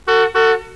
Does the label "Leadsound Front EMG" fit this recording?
No